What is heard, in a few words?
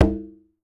CONGA, LATIN percussion; Voice Crystal - Steve Reid Definitive Percussion; LATIN SET